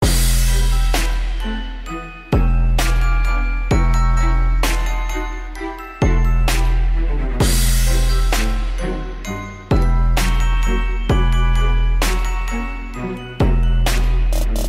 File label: Trap Mix; Nutcracker